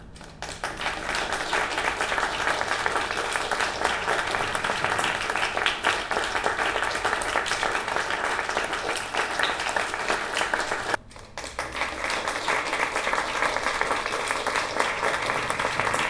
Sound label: 2 Room Test Pattern; Home Setup